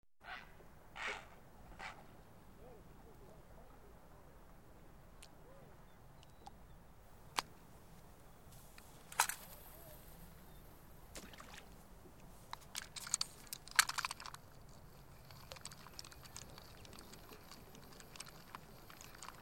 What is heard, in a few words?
Fishing in a forest lake ambience sound